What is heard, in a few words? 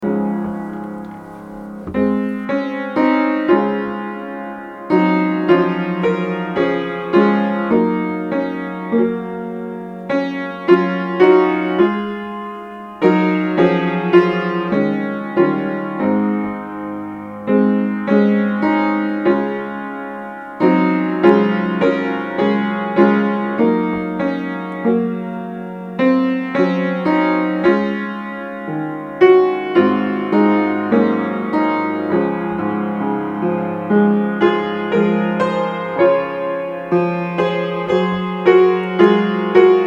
I Cannot Tell Why He Whom Angels Worship, piano; I cannot tell why He Whom Angels Worship, Should set his sights